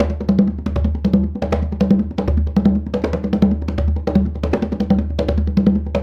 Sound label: Crazed Congas; Essential Percussion